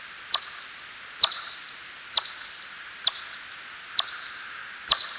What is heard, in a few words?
Myotis spp